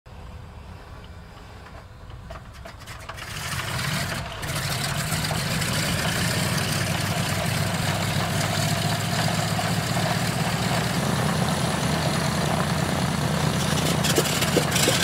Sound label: Douglas DC-3; هواپیما داگلاس دی سی تری